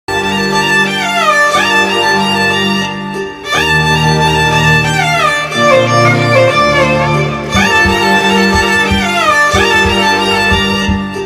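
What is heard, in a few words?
love background music song; policeodu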